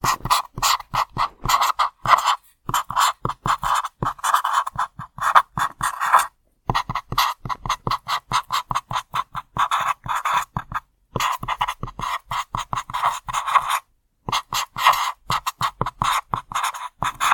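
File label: write with marker, マジックで書く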